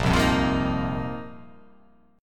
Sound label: A sharp Suspended 2nd Suspended 4th A sharp